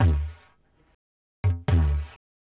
ethno, 125

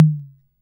KR-55

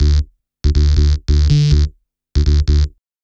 ELECTRO BASS 140 A

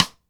dry rim, acoustic drum

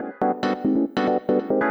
TECHNO SYNTH 140; Keys

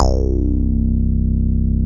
BASS MONO

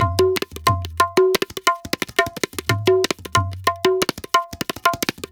ETHNO EXTRA 90; ethno2

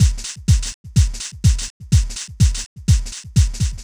DEEPHOUSE BEATS 125

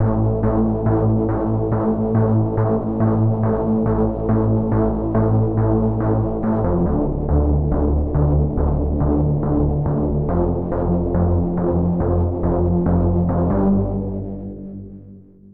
HARD BASS 140 A, Hard Trance, Bass

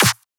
clap snare; Anthemic

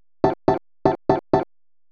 HOUSE EXTRA, 125

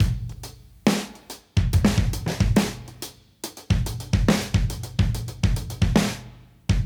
Modern Breaks; Touch Loops; ClassicBreakSessions

